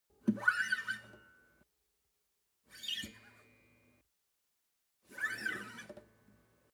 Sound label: western guitar slide noise